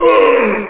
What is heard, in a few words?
woo